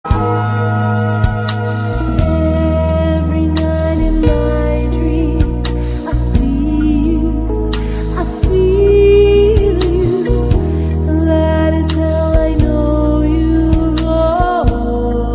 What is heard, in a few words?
Waltz